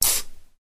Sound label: Water Spraying From A Bottle